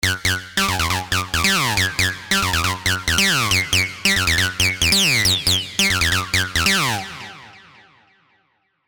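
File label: Sequence Acid, Sylenth1; Allelopathy; alonso 19 year anniversary pack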